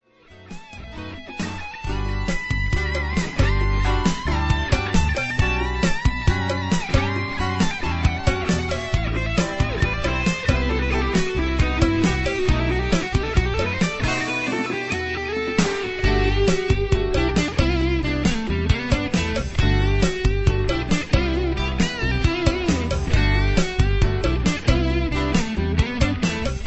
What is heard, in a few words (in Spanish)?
Guitarra